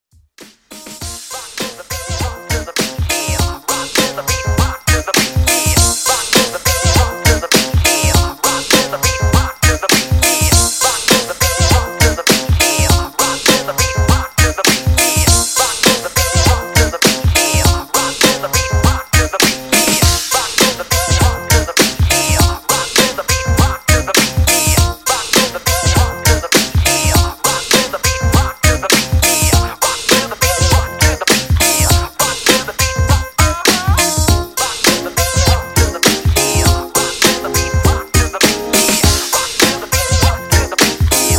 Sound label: 90s Classic 101 Bpm; Redrum